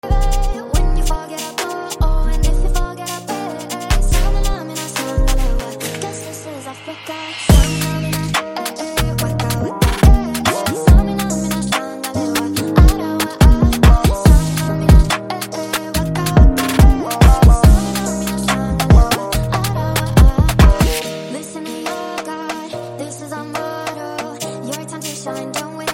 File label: Drill Remix